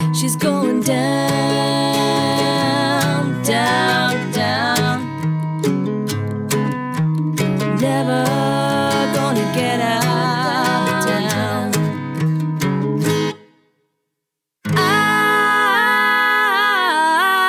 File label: acoustic